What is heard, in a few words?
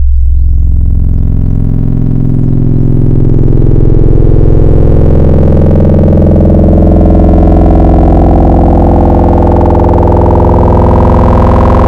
Sine Add Fm; Wavetables; Synthetic's Serum Collection; Cymatics - Wavetables Collection Vol 3